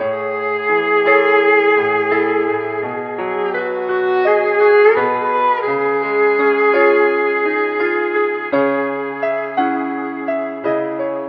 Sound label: strings and piano, Sad; loops